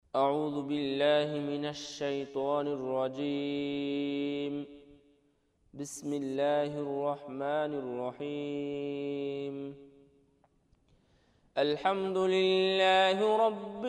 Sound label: fatiha, Quraan recitation; 001 Surat al-Fatiha; Imaam of Masjid at Markazul as-Salafiyyah Rajshahi